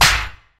rich top end steel snare drum sound g key, acoustic snare sound